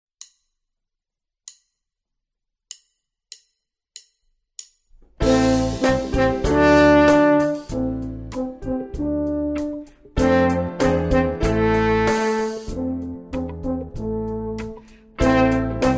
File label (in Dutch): Piano forte